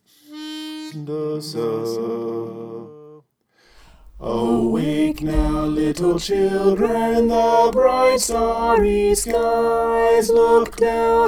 soprano

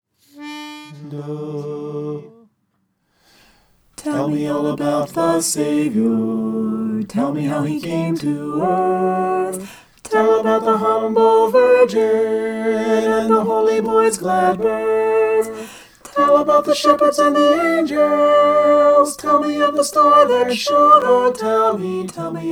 soprano

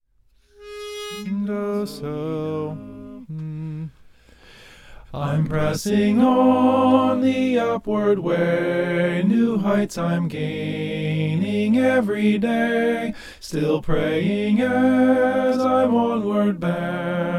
soprano

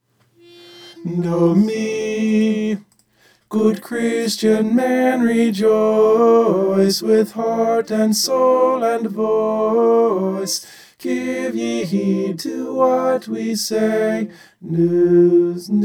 tenor

